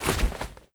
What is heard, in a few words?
cloth; Rust